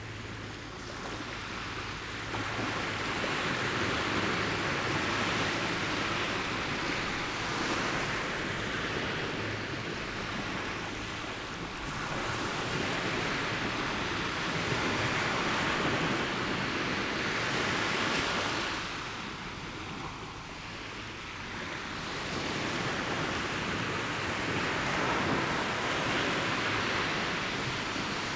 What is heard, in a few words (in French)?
plage pin d galle mer sable gravier; B K rycote